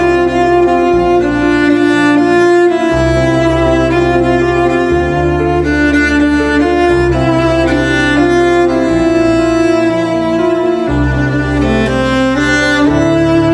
Beautiful Classical End Music Ringtone; MUSICA CLASICA

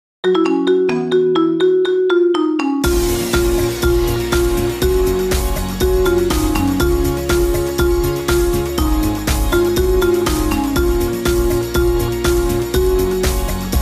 Marimba

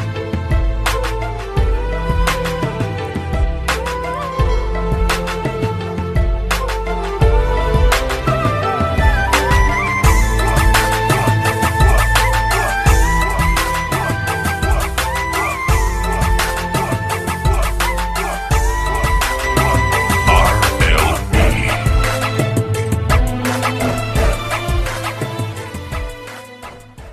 BGM Remix Ringtone Classical; MUSICA CLASICA

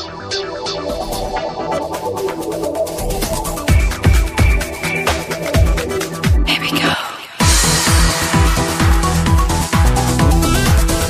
samsung S4 Ringtone; tonos para teléfonos móviles SAMSUNG

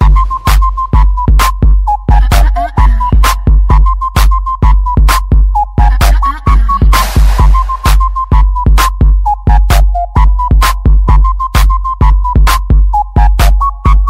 Classical flute remix Ringtone; MUSICA CLASICA; tono de llamada

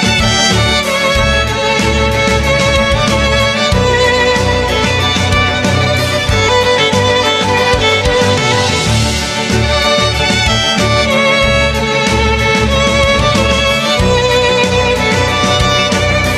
Best Classical Rain Music Ringtone; MUSICA CLASICA

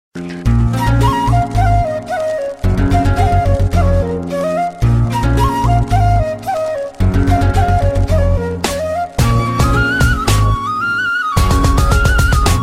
Classical nice flute music; MUSICA CLASICA

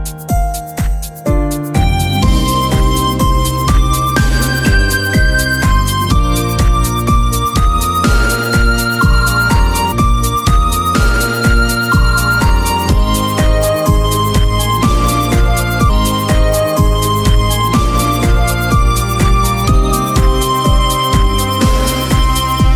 Samsung S11 Plus Ringtone

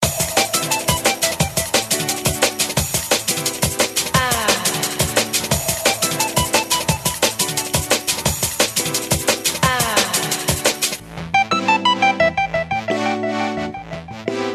Samsung original Ringtone

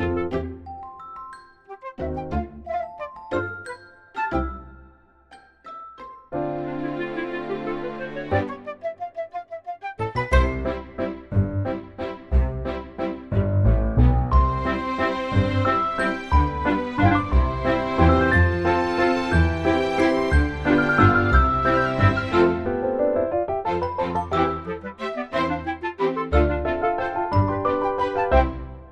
Best Classical Ringtone; MUSICA CLASICA

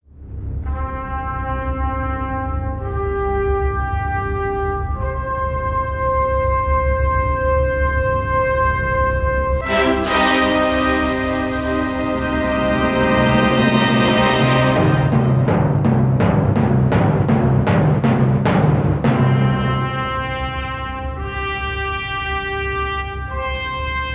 Best classical strauss Ringtone; MUSICA CLASICA

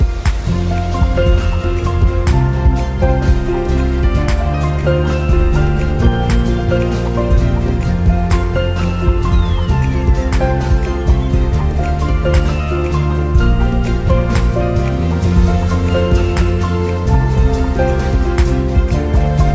Samsung S9 Original Ringtone

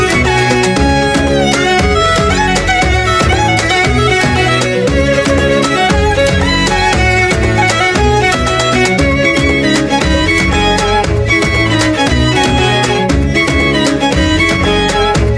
Classical music Ringtone

